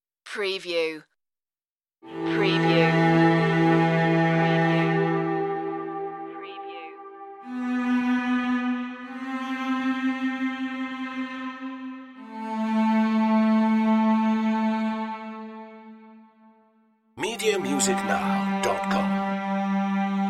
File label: forever no stutter synth